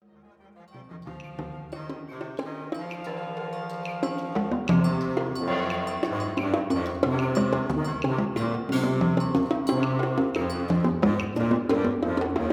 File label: Orchestra